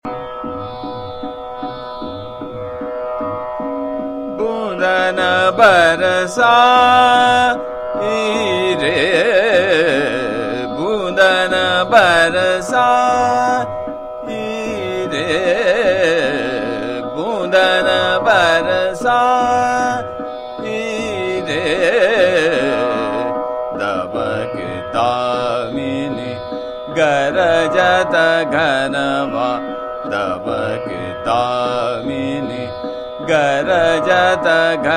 Dono Nishad Chota Khyal Boondan Barsai Re Trital, Megh Malhar